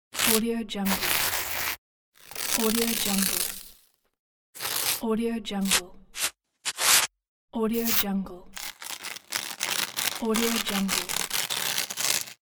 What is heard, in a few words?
paper tearing